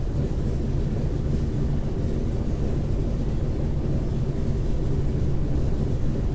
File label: c46 cockpit, engines; War Thunder